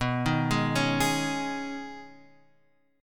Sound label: B 6th Add 9th B down Guitar Half Step Down